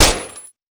TT MILITARY1945

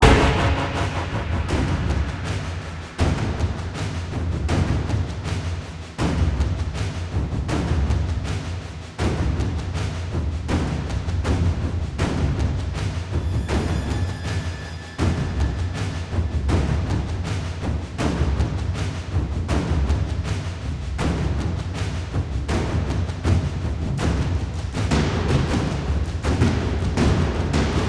Militant Drums High; Global Conversation

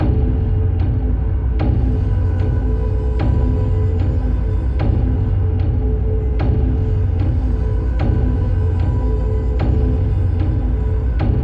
Dinner Loop; Wolfenstein The Old Blood gamerip 2015

